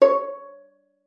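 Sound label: violin pizzicato C#5; Xsample VOL-5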